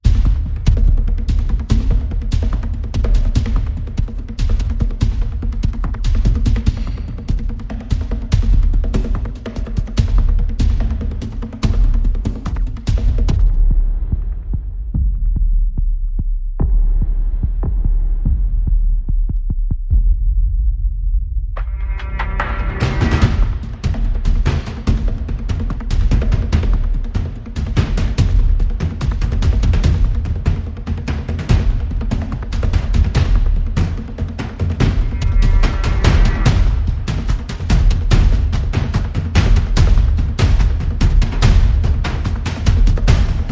Perc and FX Only

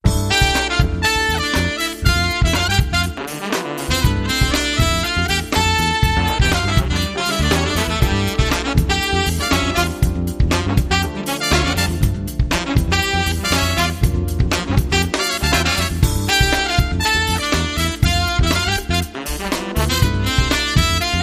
Funk Brass

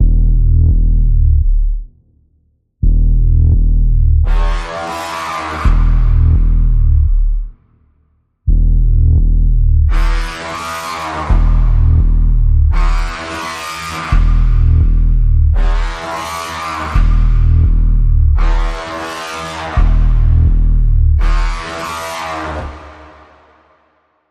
Insidious Pulse Bends E, Key of E

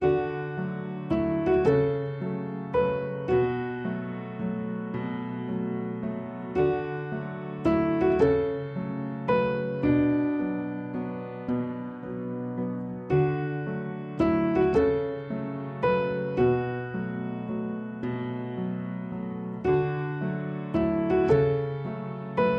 Piano Only

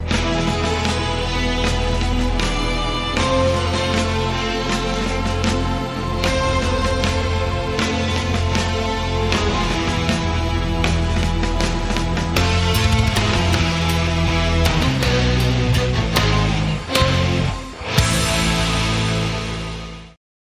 Oriental Dawn

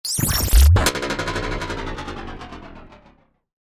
Twist & Pop

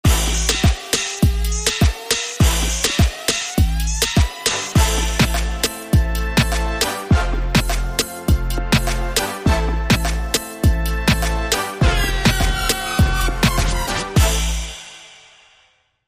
Dancehall Riddim

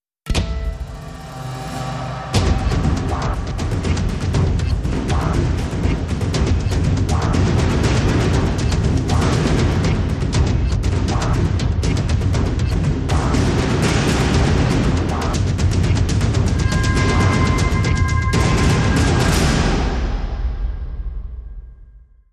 Epic Drum Outro

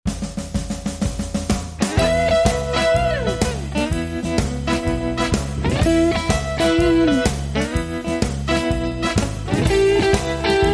Shuffle

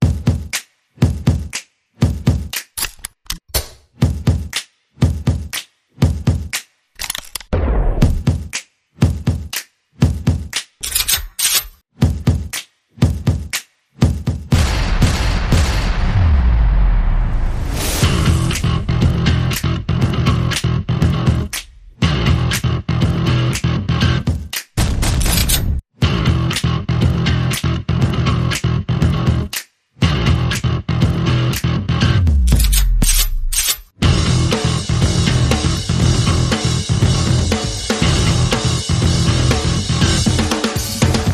Bass Perc and FX Only

